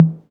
dry tom single hit e key, Royality free tom sound tuned to the E note. Loudest frequency: 202Hz; tom drum samples